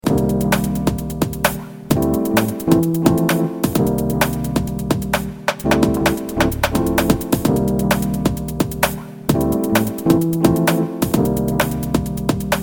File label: RingTone, trombone